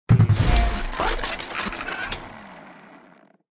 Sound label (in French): ebike panne; La-Fabrik